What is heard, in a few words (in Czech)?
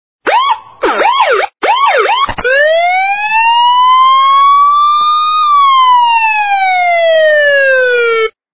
Milicejska sirena; вой ментовской сирены очень громкий; вой ментовской сирены очень громкий ,несаветую ставить на будильник.